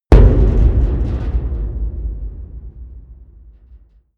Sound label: big metal impact hard punch